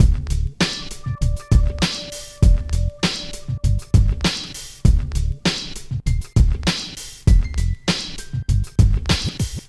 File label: Hip Hop Loops